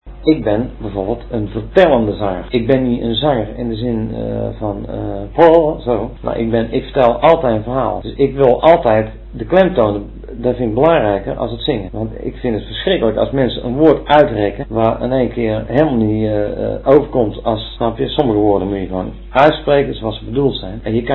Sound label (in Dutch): vertellend zanger